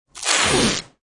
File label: Masking Tape Rip, Pulling masking tape; Offices